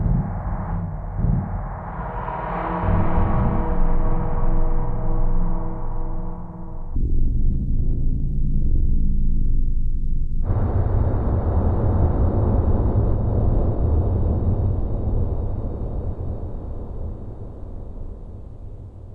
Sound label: tone alley, ambient; hl2